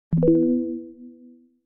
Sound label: victory